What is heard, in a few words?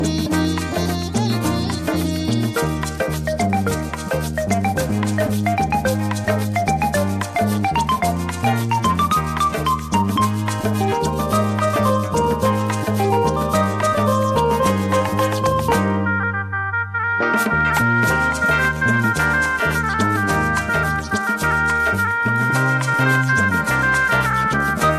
Punjabi Folk Song; bolaan mail